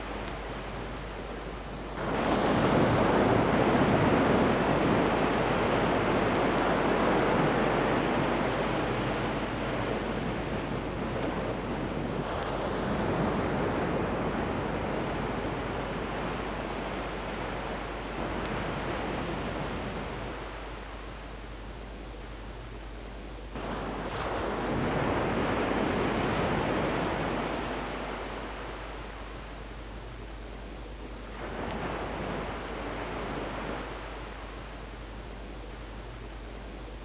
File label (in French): mer; Réflexion; Mosaïcon